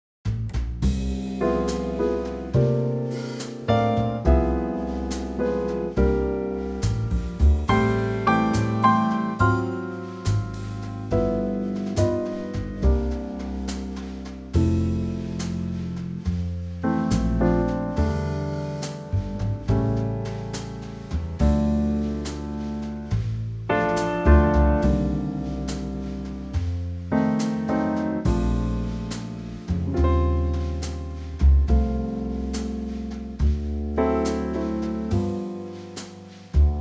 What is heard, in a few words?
F Trio